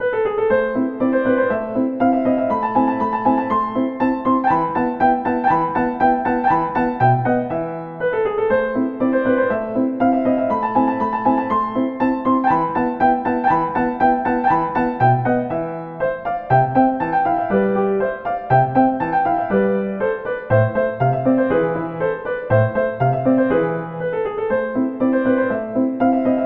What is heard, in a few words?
Turkish March